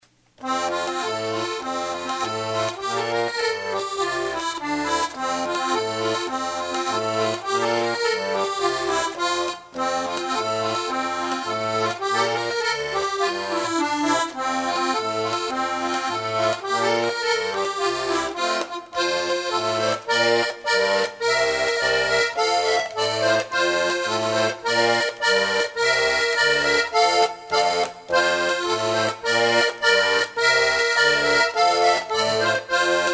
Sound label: polka; linksmuole